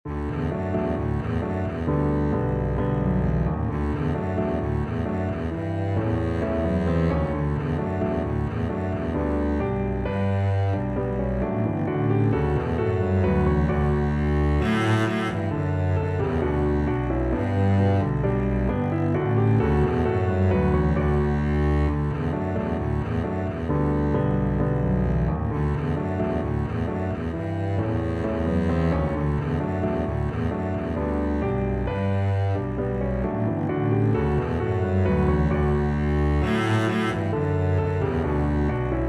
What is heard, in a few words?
over the hills, Double Bass